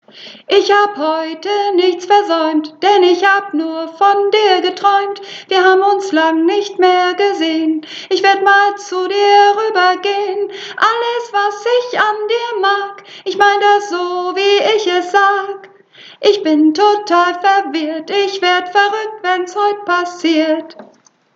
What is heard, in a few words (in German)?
Sopran